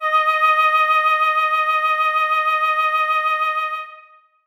piccolo d#4; Sonatina Symphonic Orchestra

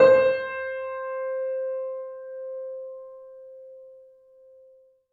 piano f c5, Grand Piano; Sonatina Symphonic Orchestra

